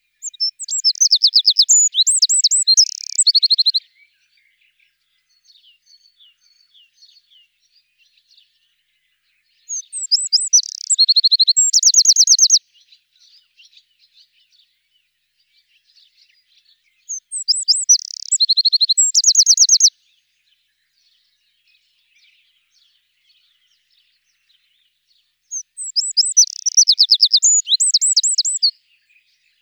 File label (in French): troglodyte mignon